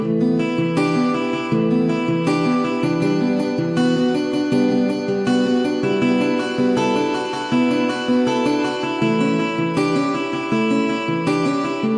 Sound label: guitar beautiful; MELODIC LOOPS